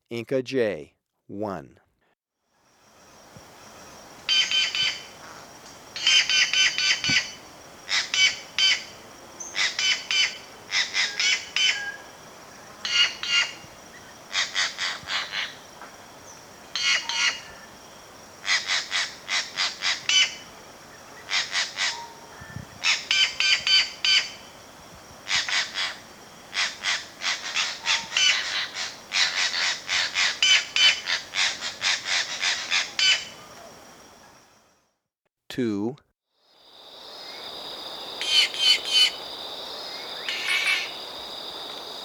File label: Inca Jay (Green Jay), Birds of Ecuador; S. America